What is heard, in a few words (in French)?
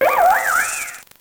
Cri